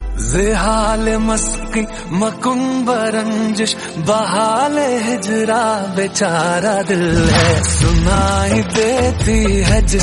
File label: Song Hindi